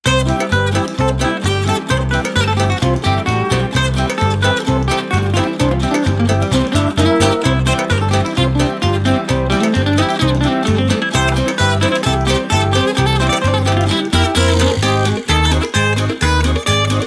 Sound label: Cartoon Circus